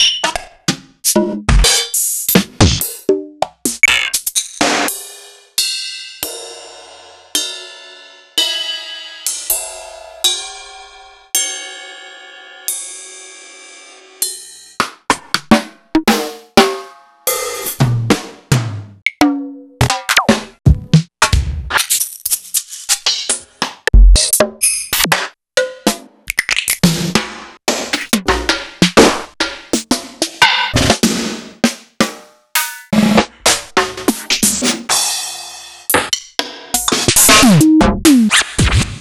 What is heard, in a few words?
EMU Proteus-2000